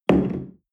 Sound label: espresso place tablecloth